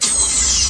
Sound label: phaser; sona